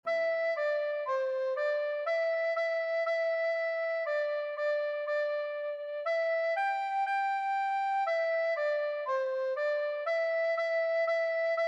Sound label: Mary had a little Lamb Recorder